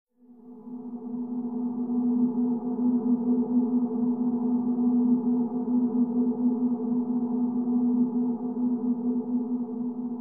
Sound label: stinger; Myst III Exile